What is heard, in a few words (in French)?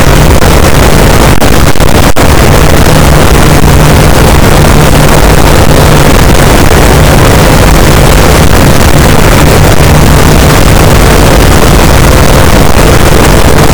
cachalot positif, boat; Physeter Macrocephalus